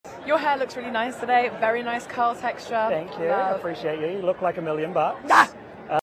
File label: Yelp